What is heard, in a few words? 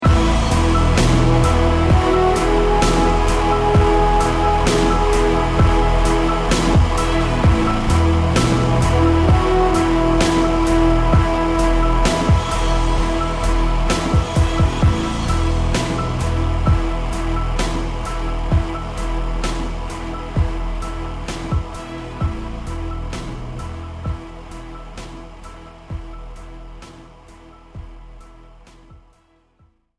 start action, music